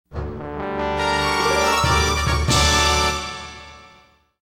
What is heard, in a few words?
fanfare; WoWInterface; AllTheThings